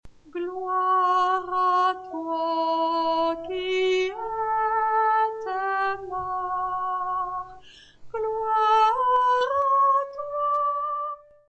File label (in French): Anamnèse Soprano